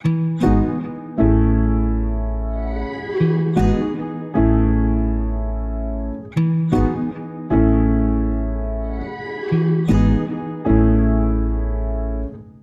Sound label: 76 BPM E Maj, Lofi Melodies; Mountains; Cymatics; Lofi Starter Pack